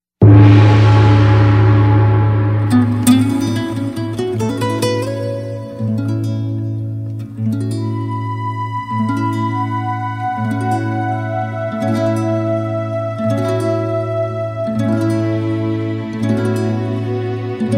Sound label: Beer Gate God Flute; Kirin